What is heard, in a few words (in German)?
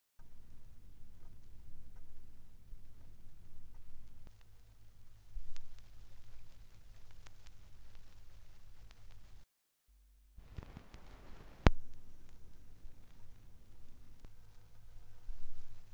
Schallplattengeraeusche